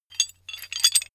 metal handling pieces

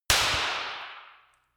gun clapping pop gun

